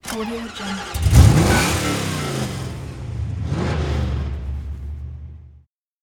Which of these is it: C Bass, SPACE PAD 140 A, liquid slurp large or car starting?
car starting